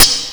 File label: HARD CLICK, Hip Hop Misc Perc; E-MU Producer Series Vol. 8 – Platinum Phatt